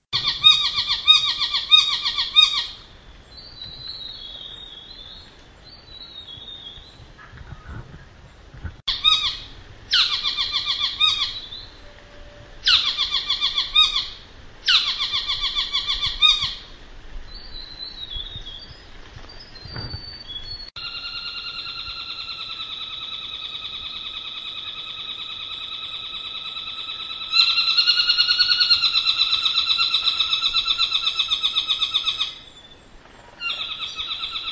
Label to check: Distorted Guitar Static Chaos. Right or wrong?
Wrong